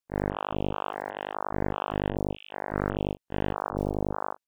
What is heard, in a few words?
synth; monopol